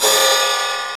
Yamaha Ride; Zero-G; Soundclash

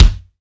KICK, FUNK KICK

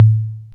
MPC lo tom; MPC Electronics The KIT

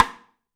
MARFSN, 3D Drums, X STICK; 3D Sound

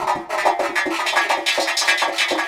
Echo Chuff Down Up, Synthetic Pulses

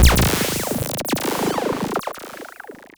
Cwejman S1; Birrgerr; DevSnd Cwejman S1 - RD

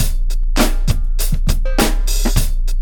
BEAT, Beats 100 bpm, Hip Hop; E-MU Formula 4000 Series Vol. 1; Hip Hop Nation

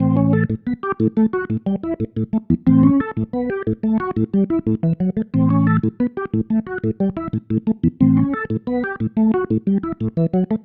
Pattern, Organ

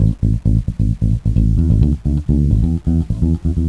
Pop, Bass; Sonic Foundry (Sony Creative Software); Crimson Blue and Fabulous Horncraft 4 RnB; Loop Samples

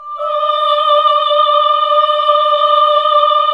HAL AAH, Nuns Aahhh

